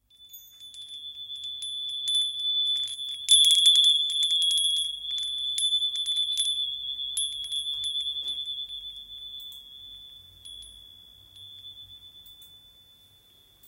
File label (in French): Carillon 3 clochettes